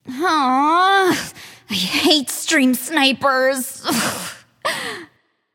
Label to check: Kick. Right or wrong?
Wrong